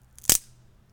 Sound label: Twig snap